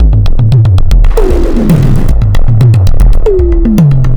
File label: EXTREMELY ABRASIVE SYNTHS